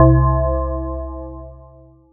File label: Dangerous Clock